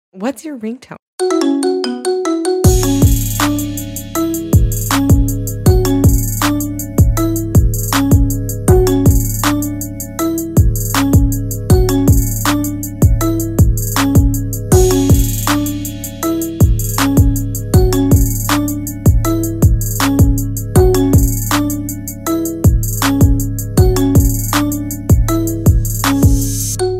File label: marimba remix